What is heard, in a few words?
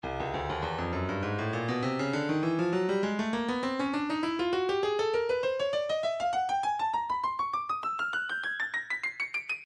breakbeat 100 bpm; afrostreet d; Stylus Breakbeat Midi Files